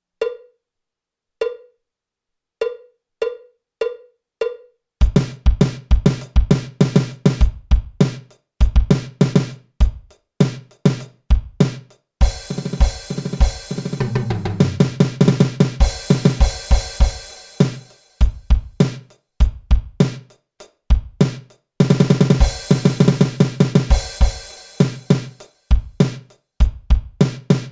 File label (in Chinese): 架子鼓独奏 摇滚融合; 66条架子鼓独奏SOLO